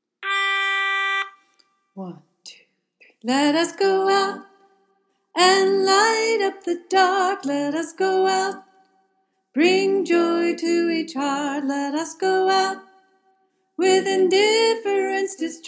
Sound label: sop, choir; freedom choir